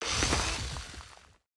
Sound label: turtoise drill; drilltoise; battle